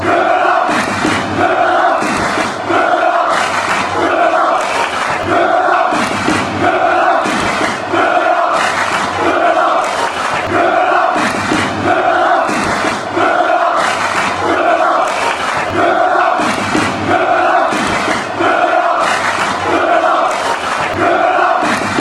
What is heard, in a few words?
clap clap clap, fanchants; copenhagen